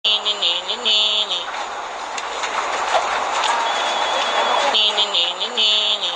Notif

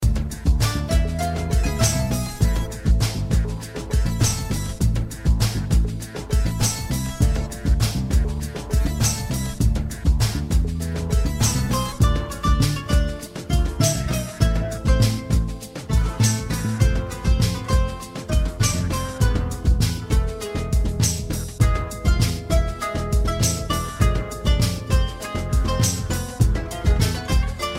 Arabic Techno

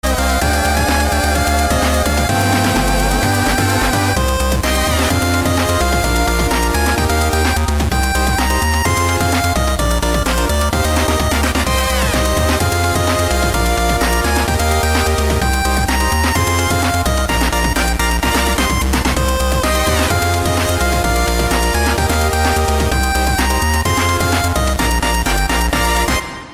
8Bit

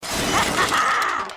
Ah ha ha; meloboom

